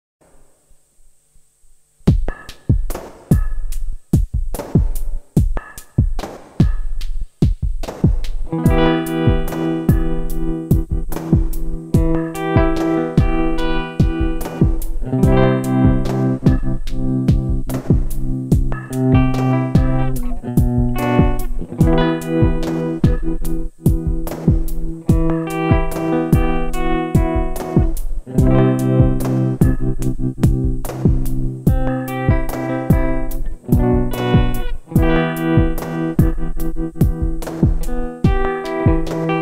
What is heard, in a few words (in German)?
mit Gitarre Tremolo; SOMA Pulsar 23